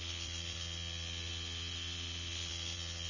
tank shield

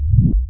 projector tank; ixian; Emperor - Battle for Dune

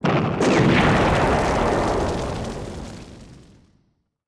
Airship Death; Sid Meier's Civilization 4 Complete; Beyond the Sword; Units

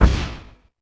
launch grenade; Sid Meier's Civilization 4 Complete; Warlords; Units

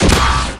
attack start, combine gunship; npc; Garry's Mod; hl2

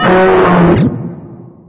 dog angry; Garry's Mod; hl2

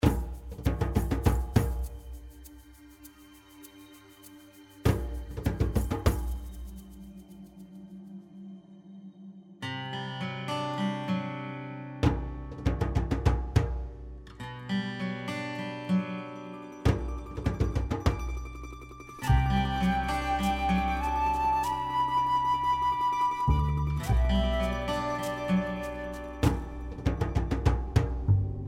Music; Age of Empires III

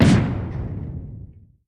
shot big; Alawar; Star Defender 4

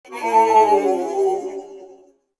Mech Death Vox; Sid Meier's Civilization 4 Complete; Beyond the Sword; Units

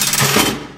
lever, buttons; Garry's Mod; hl2

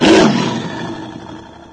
angry, antlion guard; npc; Garry's Mod; hl2

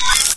game ready; Left 4 Dead 2; servers

